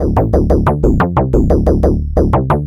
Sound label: Synth Bass; Basses